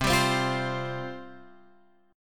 C Suspended 4th C, Guitar, Open F; Csus4 strummed; x,7,7,7,8,7

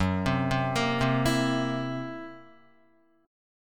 F sharp 7th Flat 5th Guitar, F#7b5 chord; arpeggiated; JGuitar

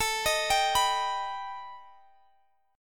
B Augmented 7th A

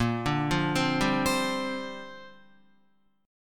Bb 6th Add 9th Bb Guitar; Bb6add9 arpeggiated

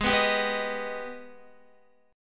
G sharp Minor Major 7th G sharp down Guitar; strummed; JGuitar

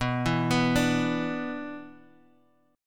B-Minor Double Flat 5th B down Guitar Open F; Bmbb5 arpeggiated; JGuitar

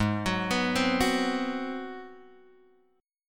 Ab 7th Sharp 9th Guitar Open G, chord

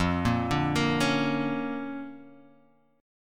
D Minor Major 7th Sharp 5th F, guitar

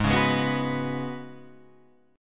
Db 7th Suspended 4th Ab, guitar

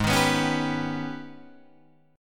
G sharp Minor Major 11th, Guitar Drop D

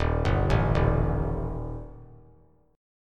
Eb Major Flat 5th Eb; EbMb5 arpeggiated; 3,2,0,1; JGuitar

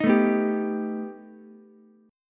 B Augmented 7th B down Ukulele; strummed; JGuitar

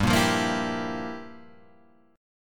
G Minor 13th G down Guitar Open G

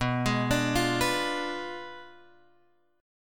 B Diminished 7th B, guitar; Bdim7 Chord {7 X 6 7 6 7} arpeggiated

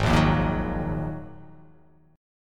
G sharp 7th Suspended 2nd G sharp

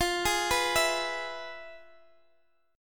F Minor Major 7th Flat 5th; FmM7b5; arpeggiated; x,x,15,13,12,12

